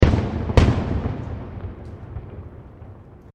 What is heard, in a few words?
Fireworks Multiple Explosions; Fireworks (Sound Effects:Backgrounds)